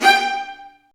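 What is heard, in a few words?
Marcato, Strings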